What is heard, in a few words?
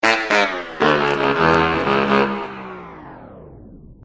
intro slow